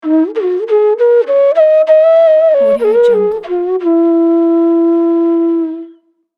indian bamboo flute

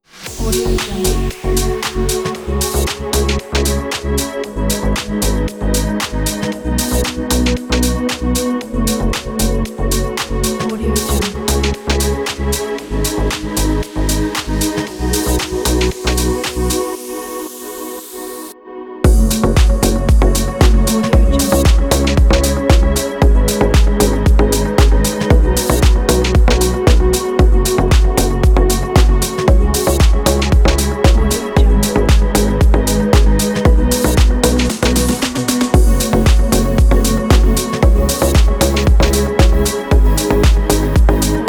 night city deep house; soundshrim

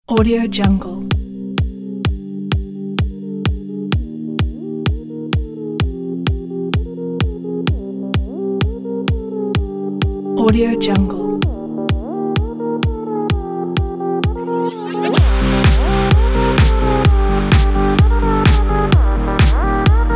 club party pack

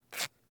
cloth rip linen

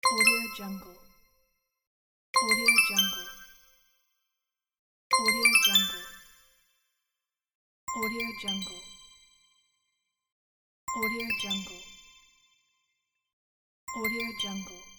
game bells

